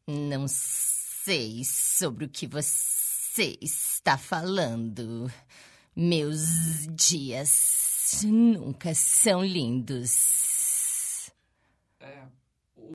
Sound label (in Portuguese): Cobra